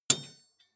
metal impact bar ring short; industrie-wirtschaft

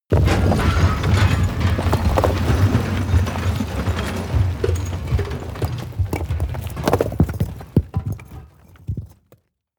explosion large shrapnel; explosionen; comedy-cartoon; soundpool

